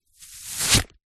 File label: sport shin guard pull off remove from leg